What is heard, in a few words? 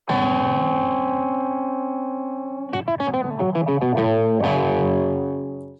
Riff